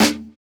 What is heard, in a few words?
Snare, jungle breaks drum sounds